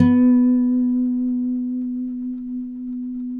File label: Finger B 3 R, Guitars; Spectrasonics; Hans Zimmer Guitars VOL-1